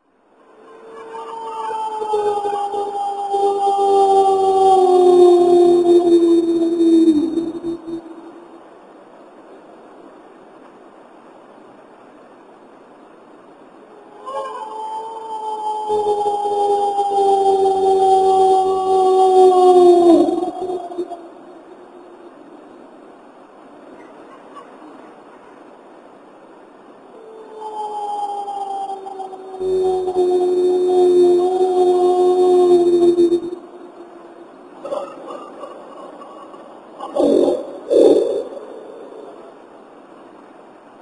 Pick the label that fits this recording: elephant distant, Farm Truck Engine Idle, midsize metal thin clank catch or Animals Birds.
elephant distant